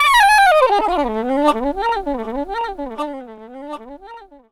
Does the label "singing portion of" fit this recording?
No